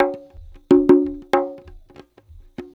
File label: Conga; Ambient Grooves; World Groove